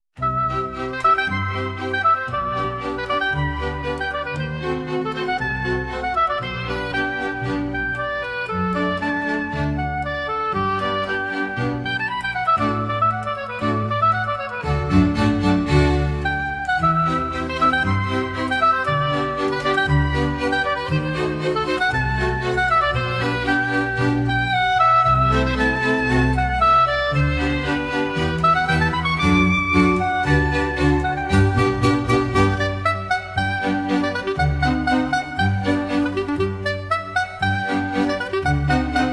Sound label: Baroque Splendor